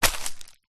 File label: footstep single twigs sticks; foley